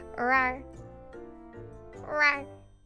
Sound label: roar